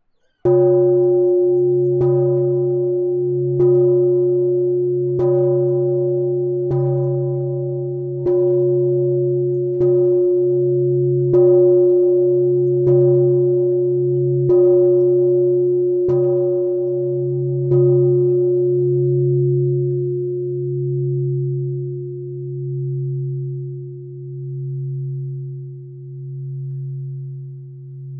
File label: bell 12 hits